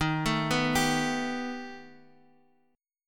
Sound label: B Augmented 7th D sharp